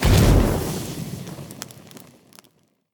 explode; Nickelodeon - Destruction Truck Derby

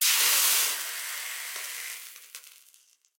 die lava; Ben 10 - Omnicode

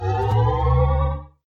tube retract; Henry Danger - Day Of Danger!

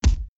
impact; Power Rangers vs Teenage Mutant Ninja Turtles - Ultimate Hero Clash 2

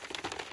wardrobe next costume; run-dorothy

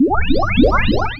spawn; Nickelodeon Basketball Stars 3; ThrillGames

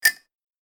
wire cut; Henry Danger - Day Of Danger!

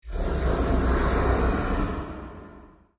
ghoul

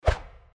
sword; Keep Out! A Dungeon Adventure for the Brave

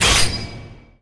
glint; Power Rangers vs Teenage Mutant Ninja Turtles - Ultimate Hero Clash 2

